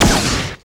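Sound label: FG SHOTS, future gun; Total Control - Action Cutz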